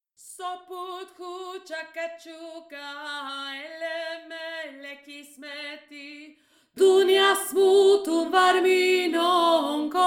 voix aigue

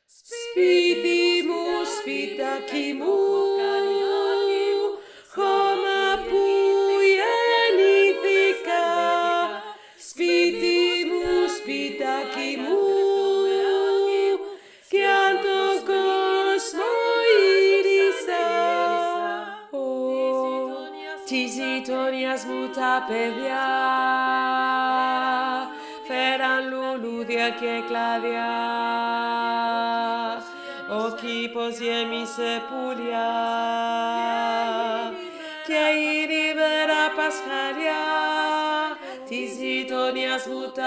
voix

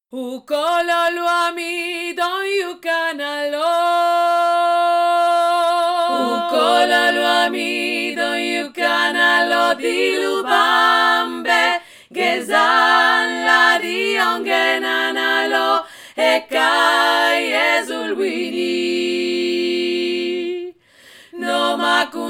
3 VOIX

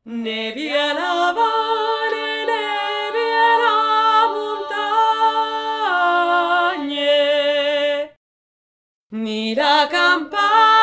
VOIX AIGUE; CASCA LULIVA

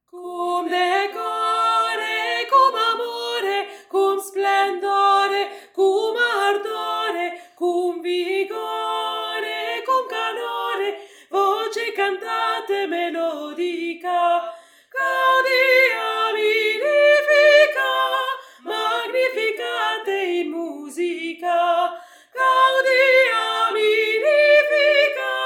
VOIX PRINCIPALE; CUM DECORE

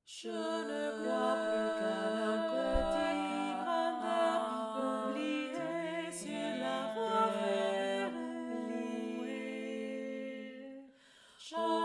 VOIX AIGUE

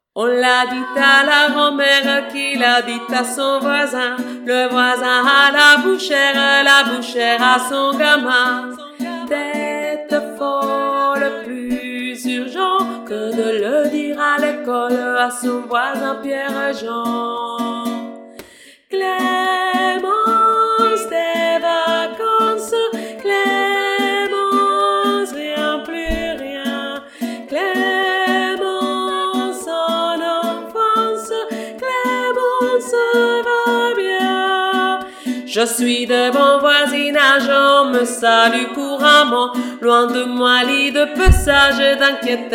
voix milieu

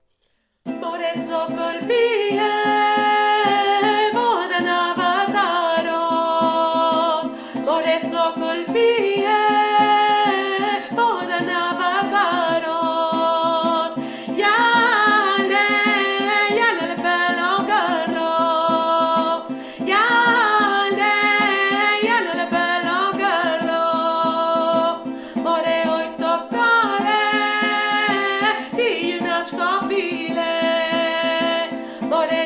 VOIX AIGUE

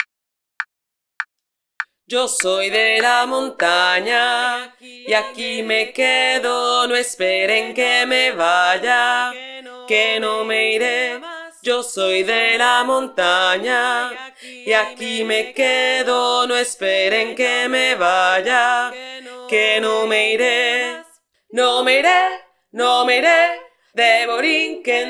VOIX GRAVE

